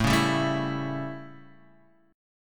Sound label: A 7th Guitar Standard, chord; strummed; JGuitar